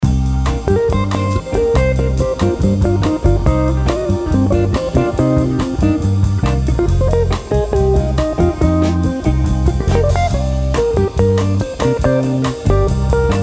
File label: bossa da blues, pro band backing jam rhythm tracks drums